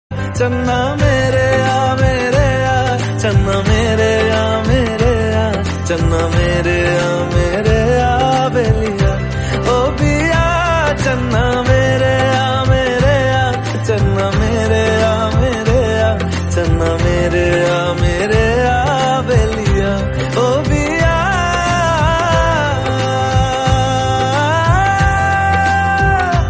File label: ringtone instrumental